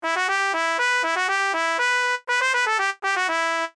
brass; angry birds 2025

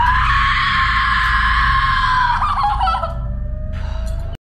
scream; jenna ortega

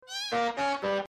farting; mr rude; 3 poops

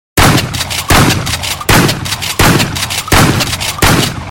shotgun

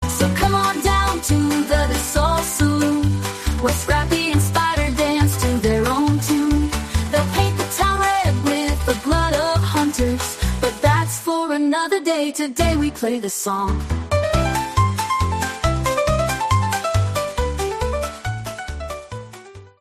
saloon jingle

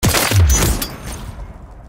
hitmarker headshot sound; mwiii

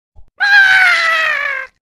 unused bomb launch sound; angry birds

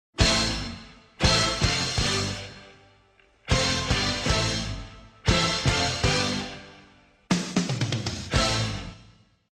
intro sound effect

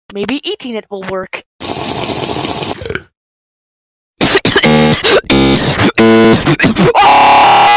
lagging cough

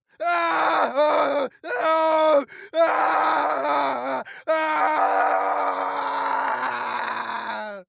british guy screaming

